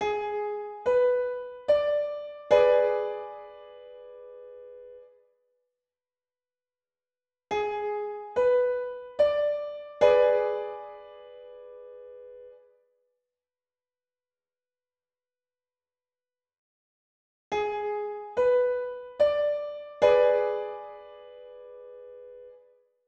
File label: Triad Audio